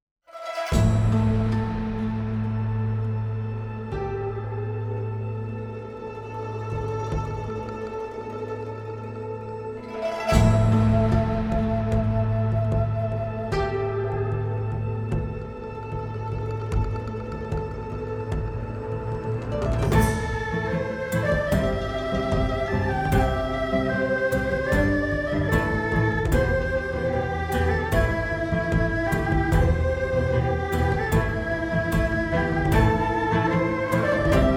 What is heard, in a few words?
Ethnic Orchestra; JADE